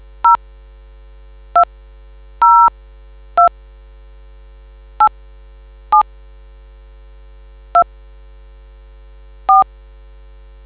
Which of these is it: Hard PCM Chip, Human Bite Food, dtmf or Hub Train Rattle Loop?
dtmf